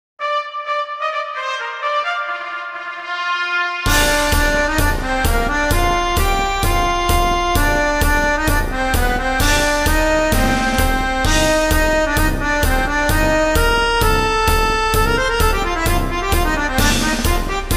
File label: Bagpipe, Polka